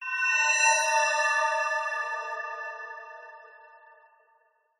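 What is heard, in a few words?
Events Level Ups Power Ups; PMSFX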